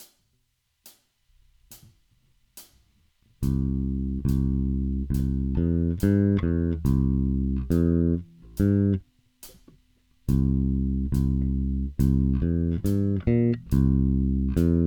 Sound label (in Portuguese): sequencias, baixo rock